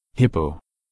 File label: hippo; wild animals